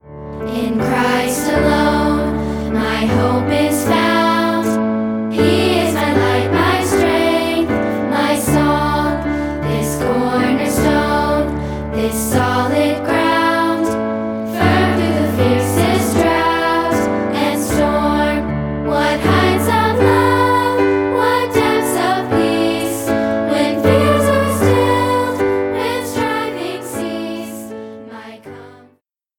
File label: Piano